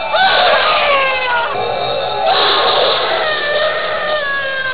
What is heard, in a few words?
screams